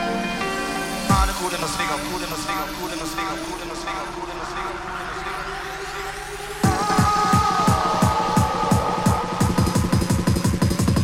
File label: jazz thing